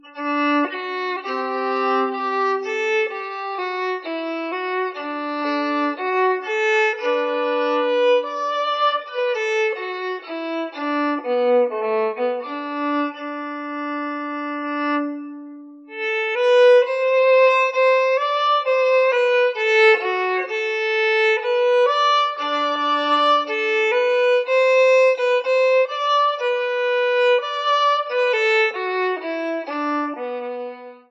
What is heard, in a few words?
Fiddle